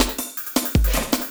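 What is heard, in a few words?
loop snare drum, 160 BPM, Drum & Bass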